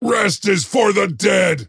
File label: move pain; dota; axe